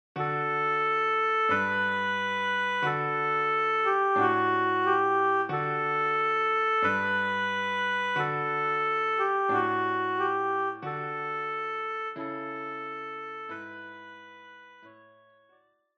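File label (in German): O du fröhliche Oboe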